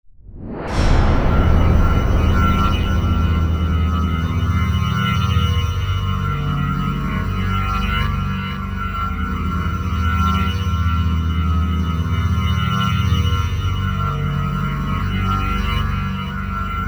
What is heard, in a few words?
piano waves pad